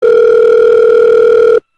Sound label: ring a ding